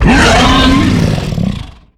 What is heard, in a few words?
Harpooned; Evolve; Behemoth